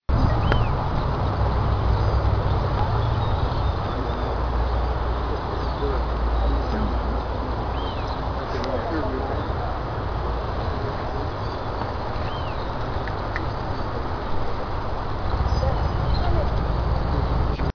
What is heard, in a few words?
Elaenia